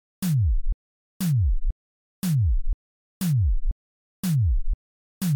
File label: superdirt supercollider test tom